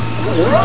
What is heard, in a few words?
Whirr